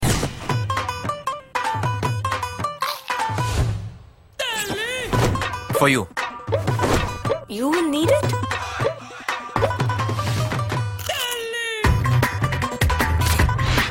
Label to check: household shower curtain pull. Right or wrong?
Wrong